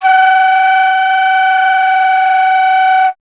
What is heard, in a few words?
Alto Flute